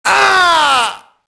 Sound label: mobster death; Max Payne